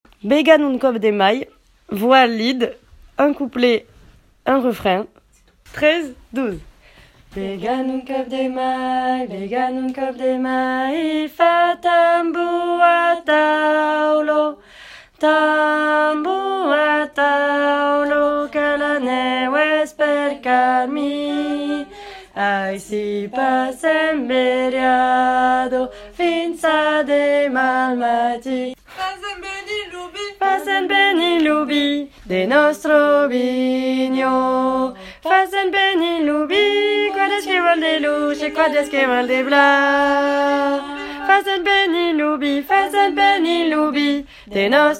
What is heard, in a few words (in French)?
voix Lead; LA CHORALE ANARCHISTE